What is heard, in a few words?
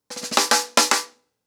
Snare 110BPM, ACOUSTIC DRUMS; Sonokinetic - The Roots Drumloops